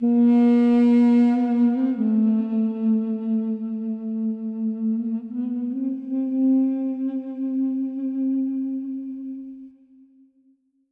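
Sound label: duduk sounds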